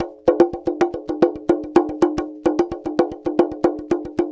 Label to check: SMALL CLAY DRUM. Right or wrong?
Right